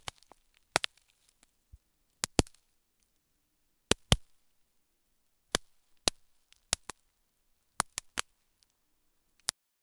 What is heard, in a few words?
vines snapping whip crac; plants